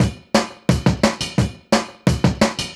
DNB BREAK

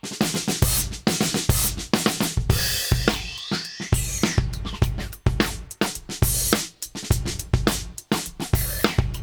fx, Beat Loops; Zero-G; Monster Beats

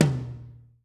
tom, drum; Masterbits - Total Zone

